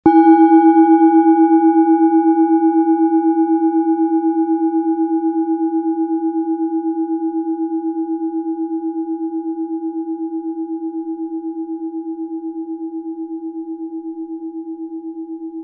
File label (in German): klangschale eisen